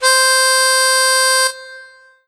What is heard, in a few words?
Korg Triton Harmonica C5